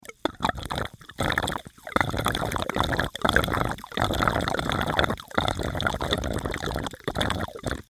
straw

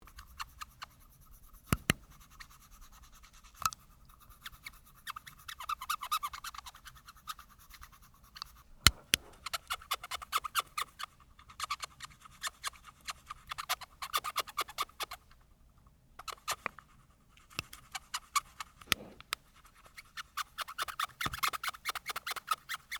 dental floss

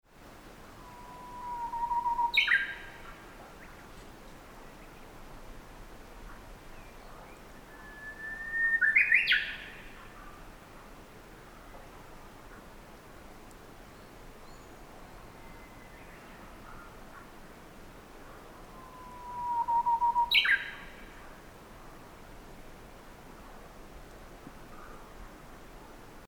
Japanese nightingale

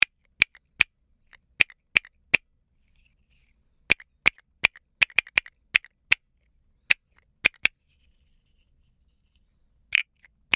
castanets

